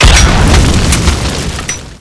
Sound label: cannon reload, weapons